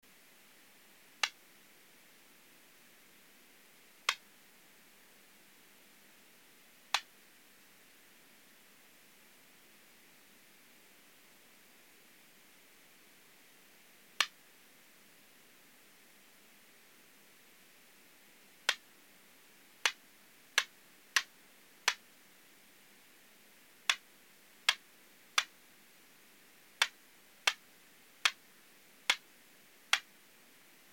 Poecilimon schmidtii lab recording 27°C bat detector at 30 kHz